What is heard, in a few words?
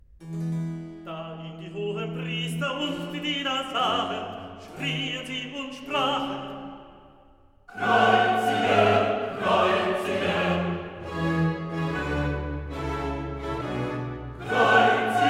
Recitativo, Coro; evangelist Da ihn die Hohenpriester; Kreuzige, kreuzige!; Klassika; Handel Masterworks